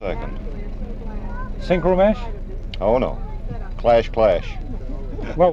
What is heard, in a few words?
Automobile; Tony Schwartz Collection